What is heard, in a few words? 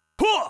Champion attack